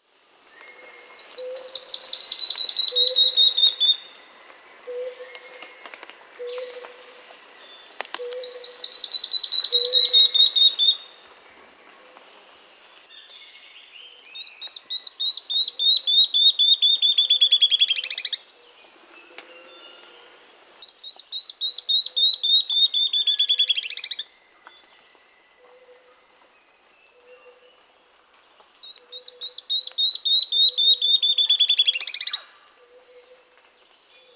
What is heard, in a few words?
Tawny-Throated Leaftosser (Sclerurus, S. America